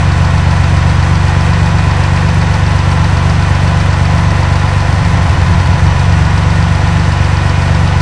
pitbull idle; gdi

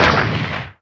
mantis fire

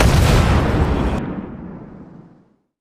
fire 140mm

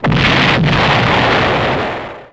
dynamite explode

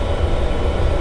shockwave loop; gdi

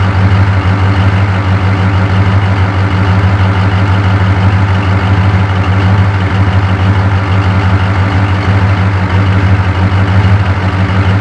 apc idle; gdi

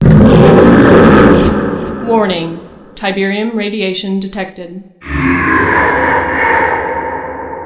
mutated callout

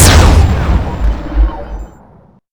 rifle fire

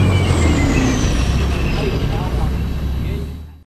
m60 engine end

